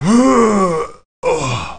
death; kingpin

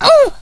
HURT PAIN; VIP

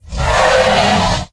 snake pain; dn3d r; enforcer

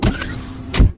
door; dn3d

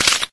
eagle clip in; dn3d r

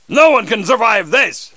MALE MELEE ATTACK; combatarms2

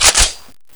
m4 bolt pull; m727

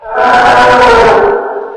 octabrain pain; dn3d r

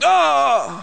death; terror

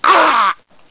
MALE DEATH; combatarms2

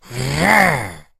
zombie attack; justzombies

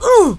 pain, female; kingpin loc

